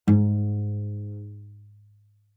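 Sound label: Cello pizz fortissimo sul G Ab2; MIS Pitches - 2014; Strings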